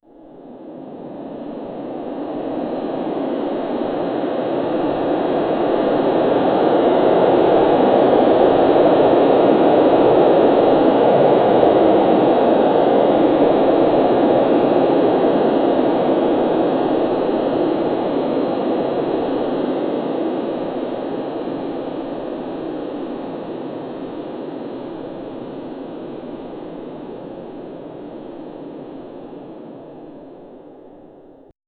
passage dans un tunnel, passage

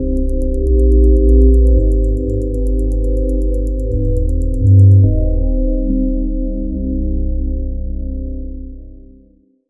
musique lounge lectroniqu

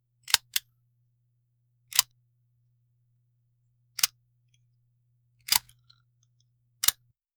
le bruit ou on enlves, magazine

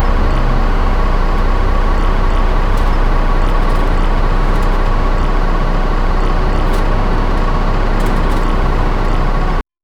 ambiance dans un bus plut, transport

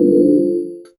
gouttes de pluie sur une

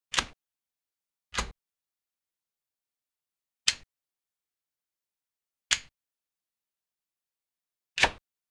archer qui lance une flec

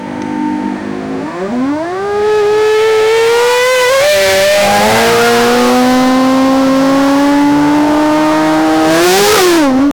moteur de ferrari formule, race car

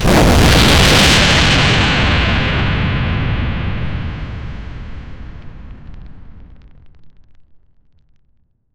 explosion de sable; sand

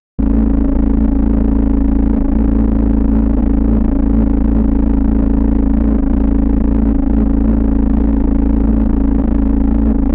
machine en fonctio

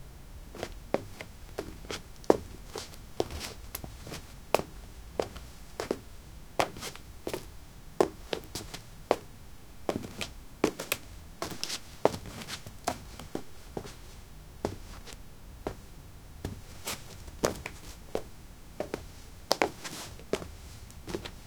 bruit de pas, far

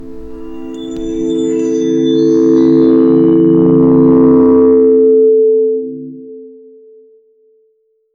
une son pour rveler le, suspense

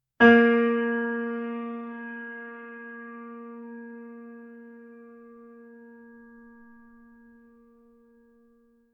piano fausse note, discordant

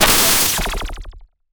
tir balistique de sniper

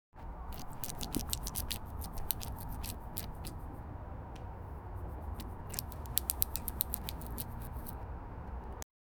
un fruit de la passion

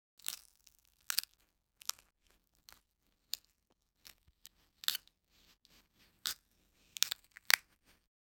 une orange juteuse se fai, food

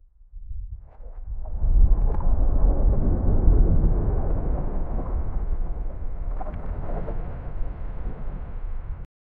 une immense baleine saute; high definition

